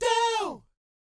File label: DOW A#4, Jazz; ILIO; Vocal Planet; Jazz & FX; JAZZCOMBO